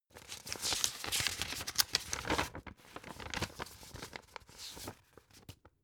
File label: old flip page long